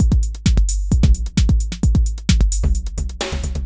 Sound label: Beat, Drums & Percussion; Electrocution; Beats